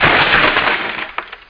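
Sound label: splinter; outlaws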